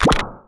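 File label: Spider trap charge; battle item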